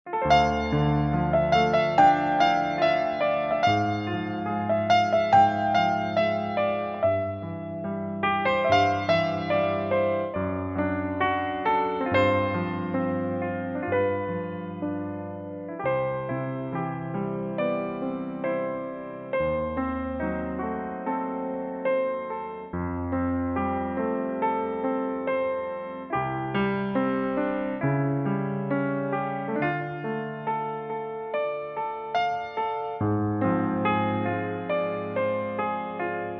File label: piano play